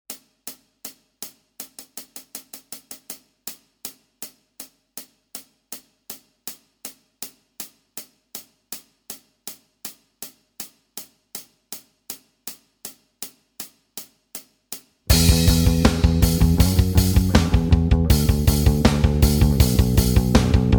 Rock backing; Guitar Techniques 2019 Issue 296 June

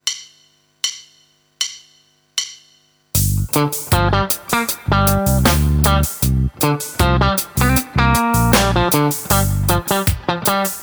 Rhythm Chops guitar Backing; Guitar Techniques 2017 Issue 272 August

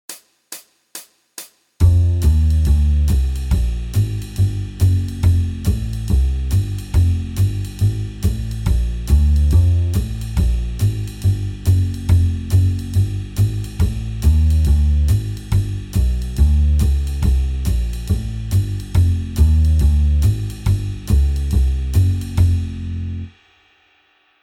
Jazz backing; Guitar Techniques 2019 Issue 296 June

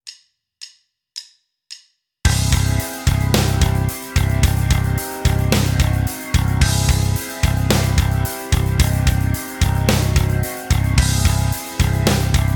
backing; Rockschool; Guitar Techniques 2019 Issue 297 July

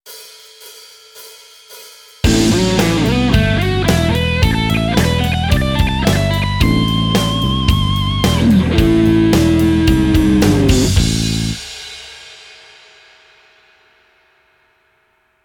One Minute Lick slow, Guitar; Guitar Techniques 2019 Issue 296 June

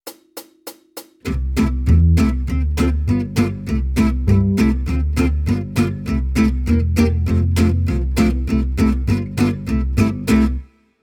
Jazz, Guitar

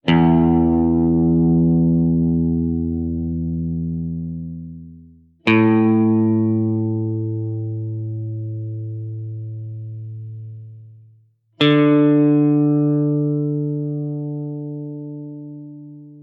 Tuning Notes, Guitar; Guitar Techniques 2019 Issue 296 June

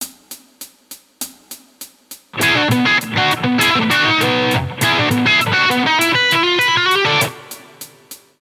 Lickbag, Guitar; Guitar Techniques 2018 Issue 289 December

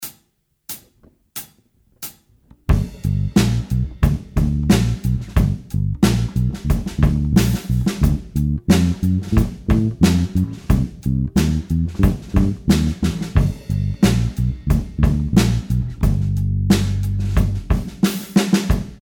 Slide backing, Guitar; Guitar Techniques 2017 Issue 272 August

